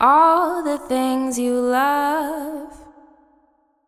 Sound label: vocal samples wet; ghosthack free vocal samples